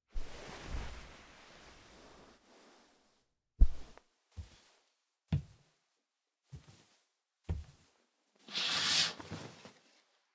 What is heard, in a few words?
Get Up And Open The Curtain; LOOPERS